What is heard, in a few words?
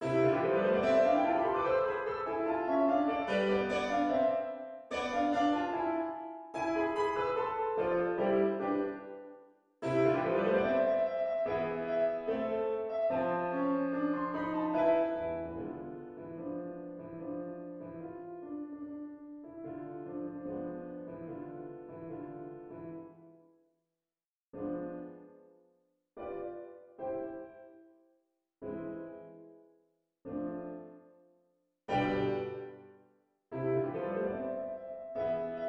Piano; Grieg Scherzo